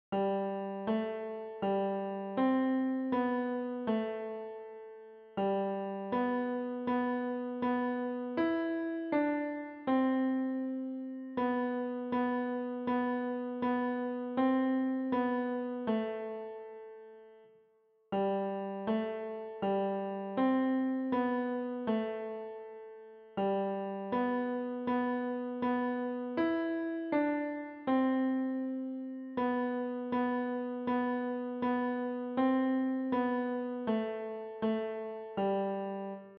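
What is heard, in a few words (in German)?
Der Mond ist aufgegangen, Klavier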